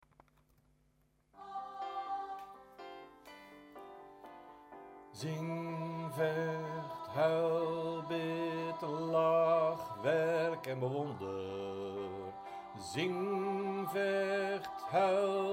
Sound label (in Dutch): Refrein Zing sopraan